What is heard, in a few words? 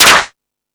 Rap Session, Drum Machines